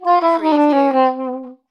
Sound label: Sax Run Descend